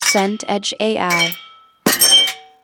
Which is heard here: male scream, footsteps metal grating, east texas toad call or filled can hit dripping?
footsteps metal grating